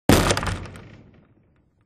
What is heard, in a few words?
glass; WMBonusXP